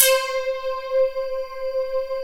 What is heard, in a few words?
METALLICS; Pro-Rec - Synthsonic; TRINITY